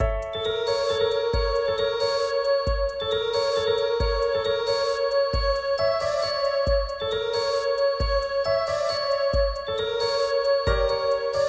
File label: keyboard